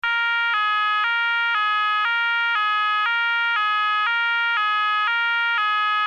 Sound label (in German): ton, Signalgeraete